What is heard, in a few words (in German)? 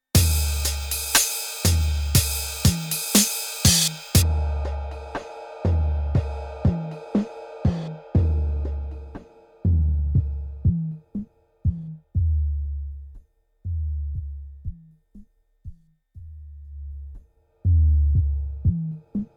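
NTS-3 Drum Tiefpassfilter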